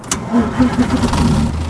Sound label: start engine, bus; vehicles